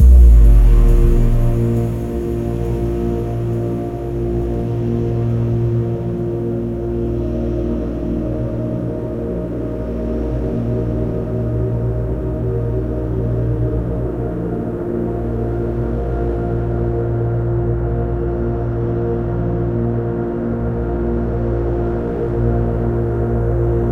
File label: ambience; Mirror's Edge (2008)